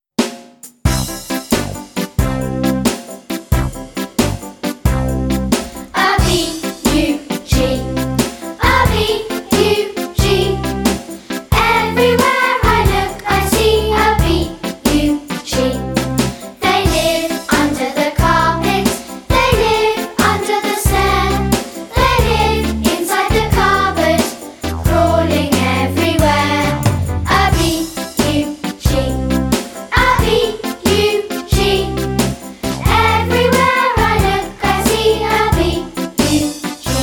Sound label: Vocal